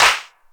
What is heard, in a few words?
clap; roommate